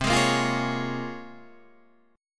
Bb Minor 7th C; Bbm7/C strummed

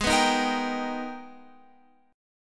Gsharp Minor Major 9th

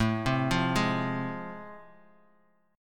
A Minor Major 7th Sharp 5th A, Bass Standard; arpeggiated; AmM7#5; 17,15,15,13; JGuitar

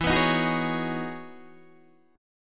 E Major 13th down Guitar DADGAD

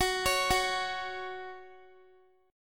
Gb 5th, Guitar; arpeggiated; 4E,4A,5D,5G,5C,6F

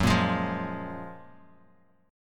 Gb Major 9th, down, Bass Standard; GbM9; strummed; JGuitar

